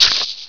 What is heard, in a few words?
snake bite